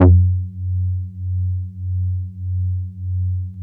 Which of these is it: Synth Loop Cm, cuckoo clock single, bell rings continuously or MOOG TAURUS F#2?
MOOG TAURUS F#2